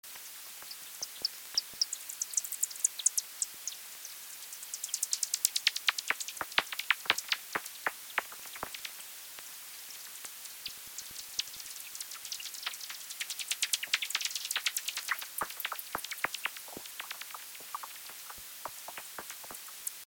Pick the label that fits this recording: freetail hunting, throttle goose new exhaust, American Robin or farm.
freetail hunting